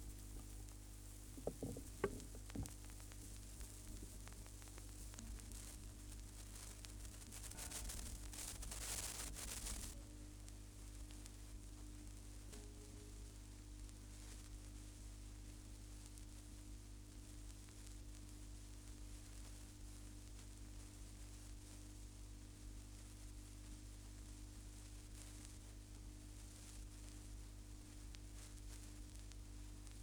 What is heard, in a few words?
Surface Noise After; GPO